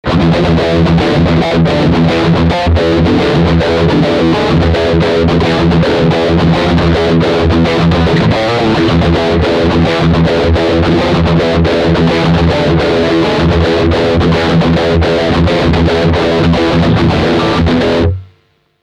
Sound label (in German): Riff Steg humbucker